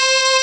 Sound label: ahh vox; Amiga Soundtracker Sample Packs